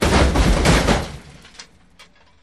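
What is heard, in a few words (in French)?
Accident crash moyen, Accidents voitures; Accidents voitrues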